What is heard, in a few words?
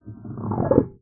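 glissando, special fx; Xsample VOL-1; 90 s Sample CDs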